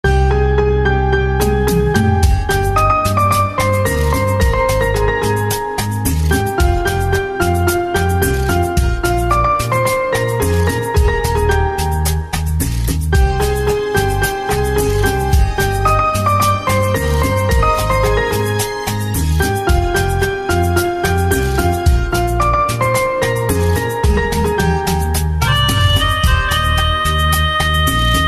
Piano Instrumental Ringtone

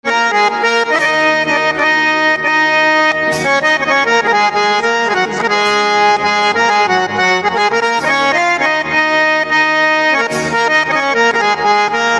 Harmonium Instrumental Ringtone; devotional instrumental ringtone

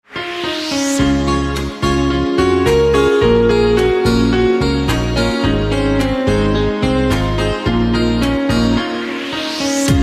Piano Instrumental Ringtone; punjabi instrumental ringtone

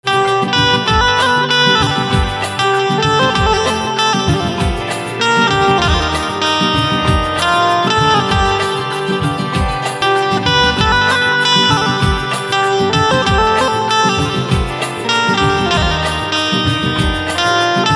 Banjo Instrumental Ringtone

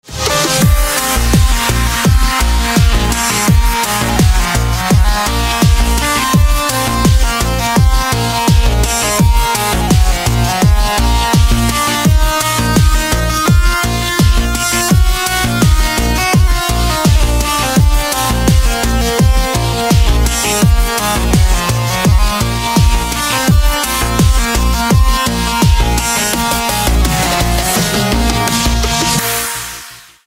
Electric Guitar Instrumental Ringtone

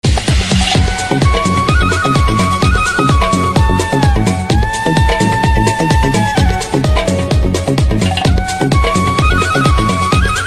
Flute Remix Instrumenal Ringtone; Movie Theme; Hero

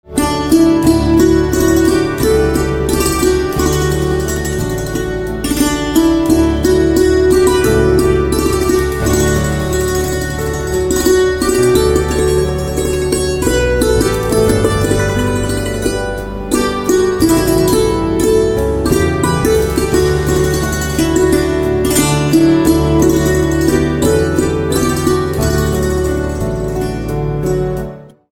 Santoor Instrumental Ringtone

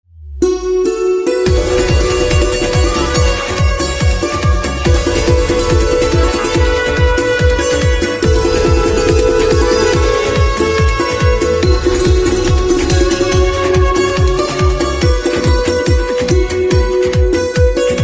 Santoor Instrumental Ringtone

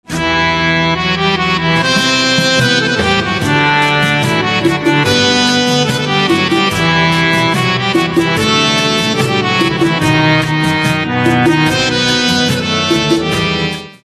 Harmonium Instrumental Ringtone

